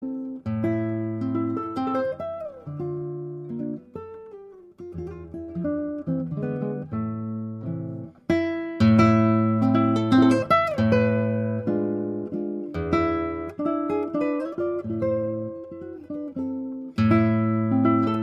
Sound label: Mazurka-Choro